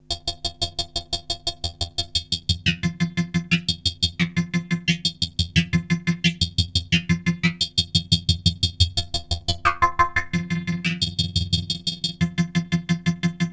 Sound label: Korg Prophecy hits, arps and fx